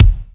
kick

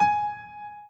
Piano Chord

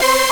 synth; osu

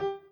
chord in g

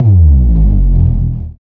Drop; osu

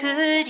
vocal

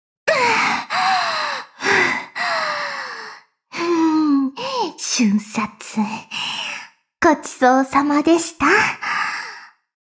fail sound

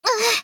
combo break

